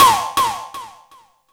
TOM DIS ECH 1 R, ELEC DRUM; USB Soundscan vol.20; Fresh Disco House I; AKAI

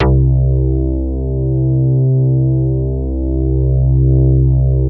Big Synth Basses

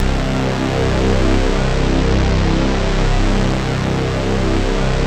MATRIXPAD, Vintage Voltage PADS; Masterbits

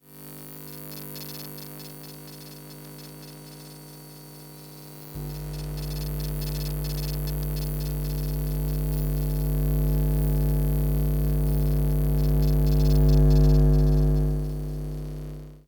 Electromagnetic Fields

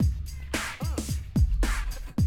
Classic DrumLoops, 110

